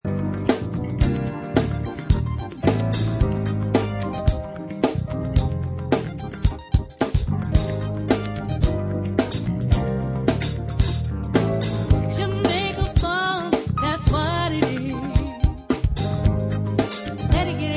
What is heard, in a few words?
Jamaica Funk